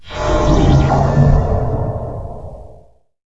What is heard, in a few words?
Knockback